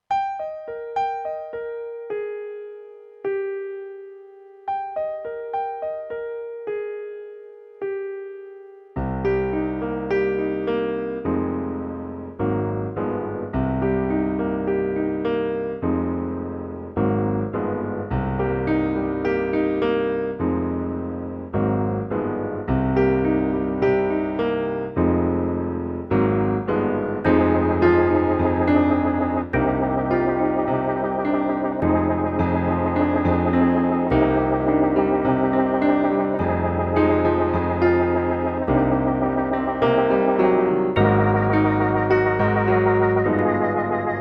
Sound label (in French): Ritournelle Pianal